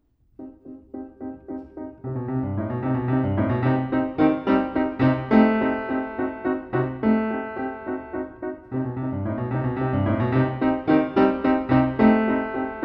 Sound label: Ballade, piano; Burgmuller